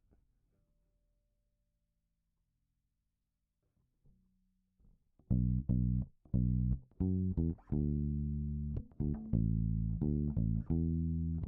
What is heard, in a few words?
Bass FX; EamonnTobin; PeterPan